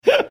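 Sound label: quick inhaling sound while laughing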